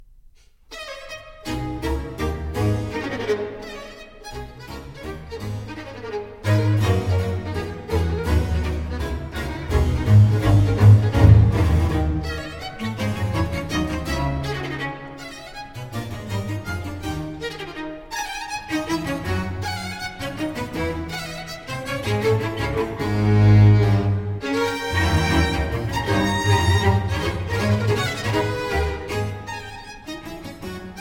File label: concerto for cello strings and basso continuo in a minor allegro; III. Allegro assai